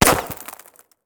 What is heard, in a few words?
Bullet Ricochet; Sound Ideas; Guns